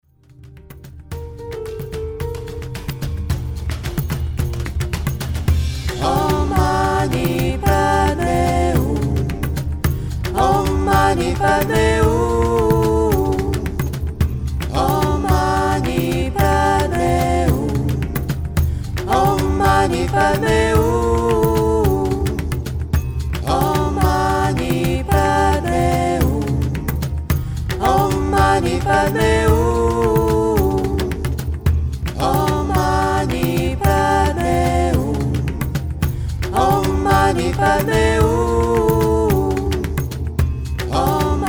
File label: OM MANI PADME HUM SOL; TURQUOISE; LE SOIN PAR LA MUSIQUE